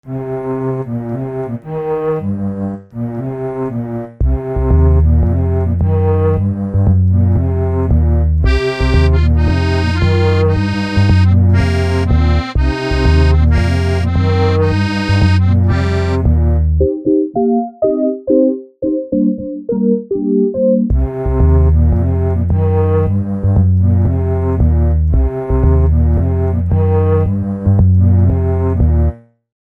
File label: Late Nite Jazz